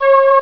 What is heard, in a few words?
Halo Pad; Prince of Persia 2 Demo